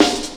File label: Snare, Percussion Hits, Snares; Jungle; Zero-G Jungle Warfare 1, 2 & 3; 90 s Sample CDs